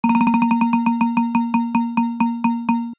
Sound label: Fortune wheel spinning; leisure